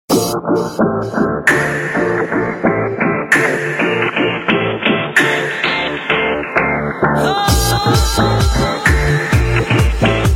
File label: nokia higher